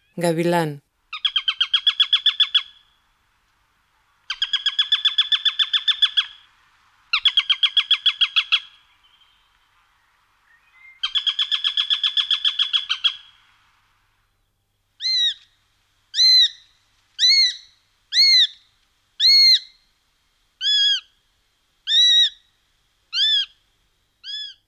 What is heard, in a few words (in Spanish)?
Gavilan; VOCALIZZAZIONI